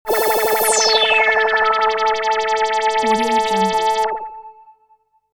musical pad scifi surrounding ufos

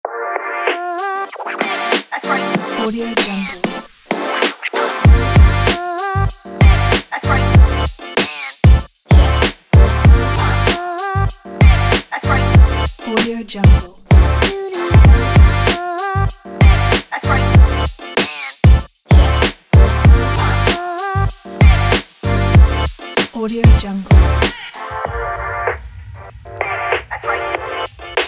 this is hiphop

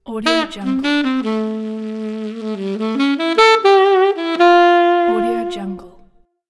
saxophone jazz logo

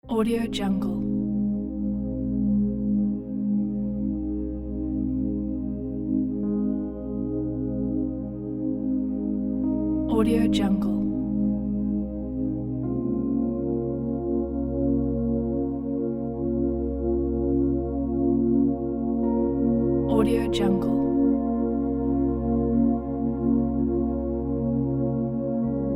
inspiring meditation flute ambience

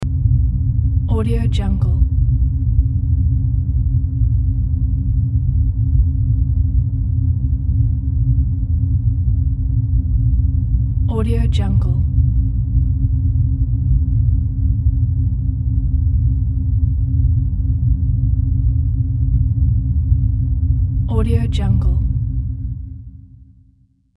underwater or space low rumbling

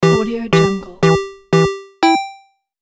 countdown; countdown asset

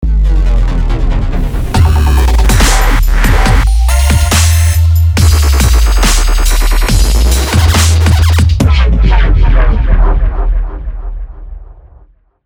dubstep logo intro

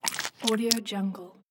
cartoon chewing

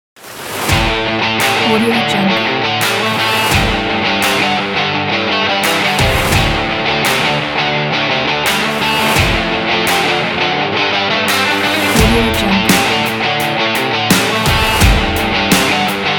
sport trailer rock action

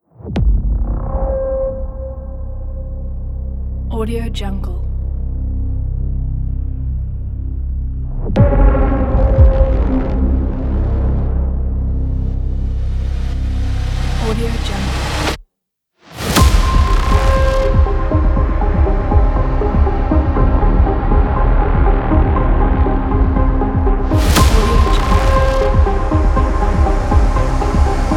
scifi trailer intro

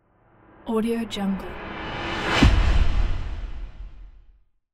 epic swell impact

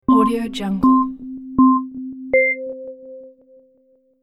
game race countdown

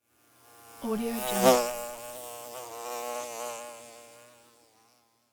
bee fly; arialiciyan

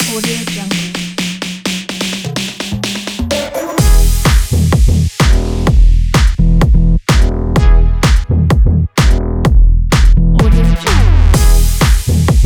techno

